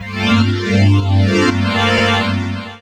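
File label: Pad Synth; Equipped Music; Loungin House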